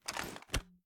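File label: inspect, shotgun; gmod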